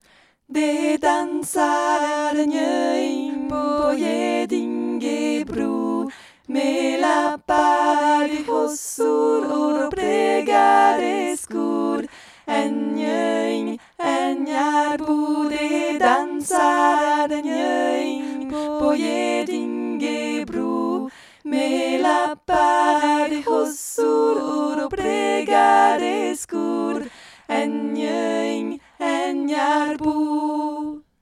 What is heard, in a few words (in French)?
3 voix plus fort